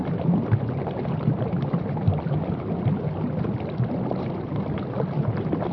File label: Water Elemental Loop